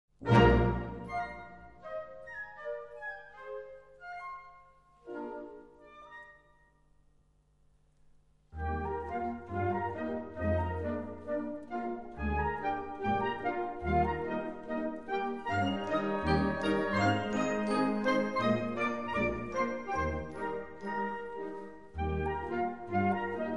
Annen Polka

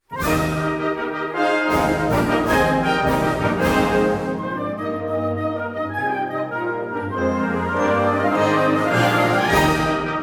seven short fanfares

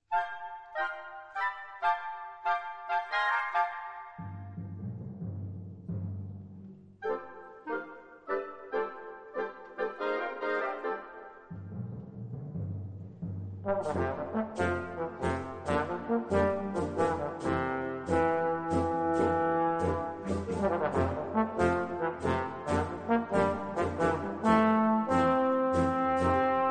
Sketches for trombones and band

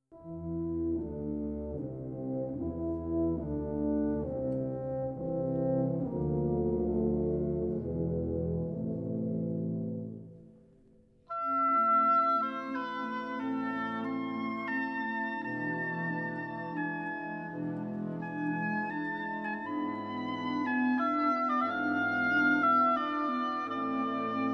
concerto for oboe and band

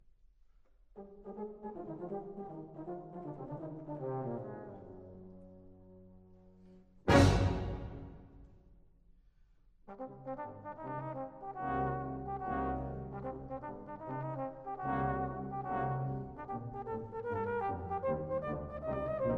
Concerto for e flat horn

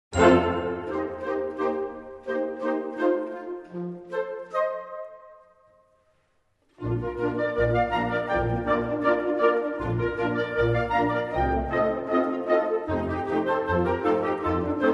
neue pizzicato polka

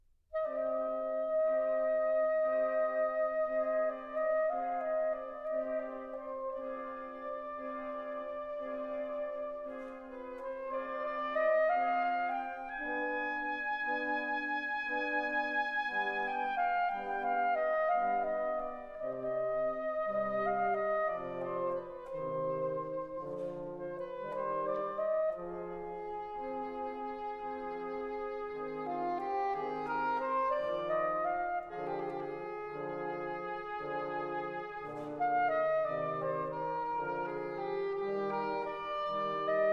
pastorale for organ

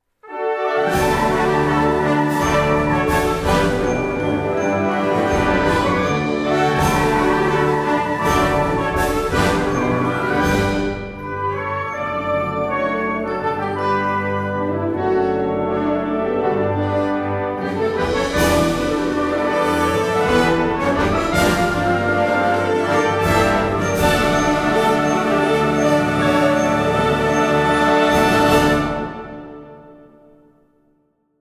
seven short fanfares

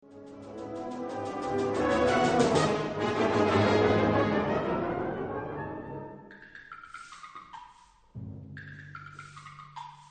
brass

